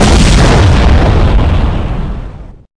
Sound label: Weapons Explode